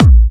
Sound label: Bassdrums UK Trance Kicks; Vengeance Samples; VEC2 Bassdrums